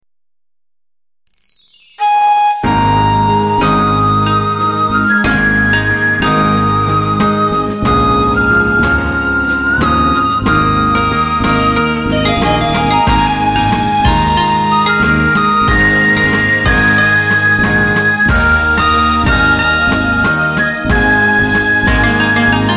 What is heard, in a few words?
beat